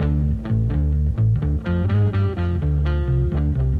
guitar

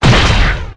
body impact; Crash Bang Boom

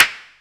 Clap n Snap, Snares

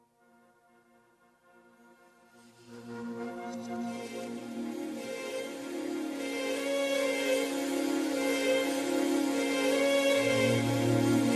strings, Sad

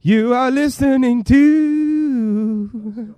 you're listening to SUNG

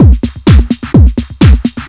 Drum loops, Electro

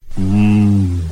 "mmm", Voice; ooh ahh; homer

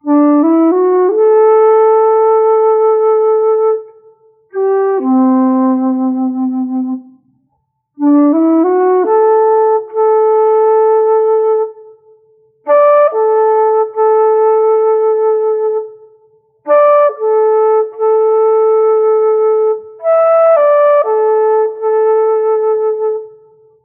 flute, Sad

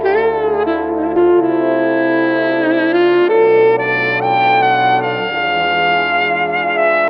sax sultry, Sad; loops

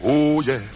"oh yeah" SUNG

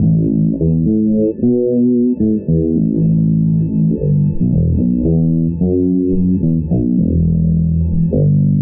bass, Loops, Phonky

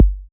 Kicks

